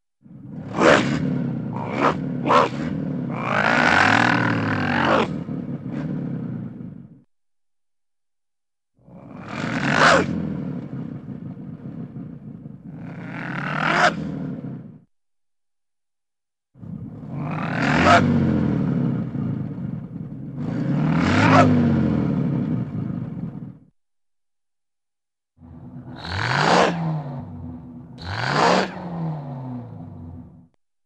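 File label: Engine Revs with vocal Growls, Cartoon; Hollywood Edge; Cartoon Trax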